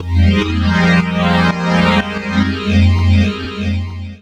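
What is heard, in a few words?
Pad Synth; Equipped Music; Loungin House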